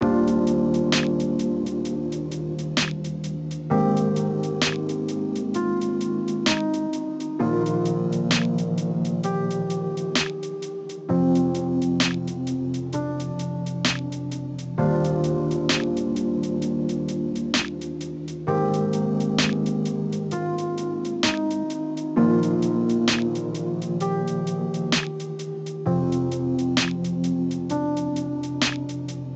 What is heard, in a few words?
Lo-Fi